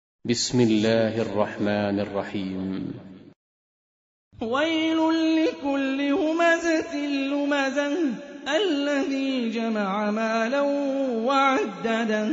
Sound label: Quran; Hani Refaey